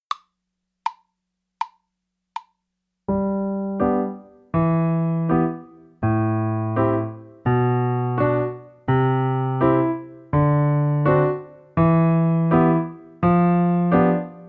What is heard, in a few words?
Chromatic scale on G accompaniment